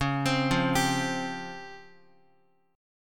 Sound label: Db Major Sharp 11th Db down Guitar; arpeggiated